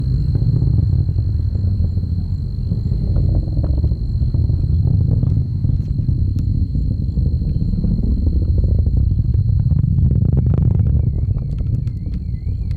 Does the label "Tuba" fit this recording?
No